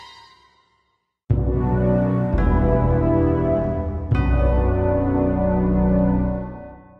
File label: death sound